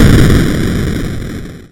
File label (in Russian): hrustyashij vzriv